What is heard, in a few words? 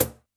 PERC, Yamaha DD-65; CAGON